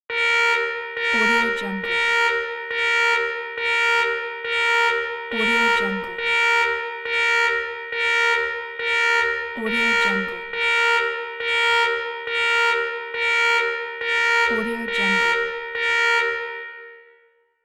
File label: hazard siren